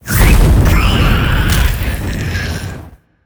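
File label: Fairy Dragon death; monster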